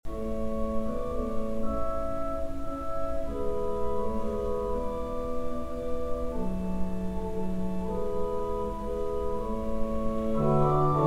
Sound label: Andante Grazioso from A Major Sonata Mozart